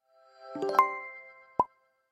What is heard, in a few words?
Notification